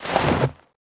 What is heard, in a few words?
snow step; Golden Crayon Sounds